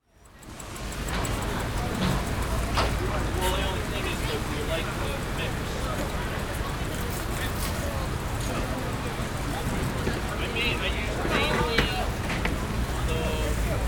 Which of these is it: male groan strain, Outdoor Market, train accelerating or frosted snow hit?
Outdoor Market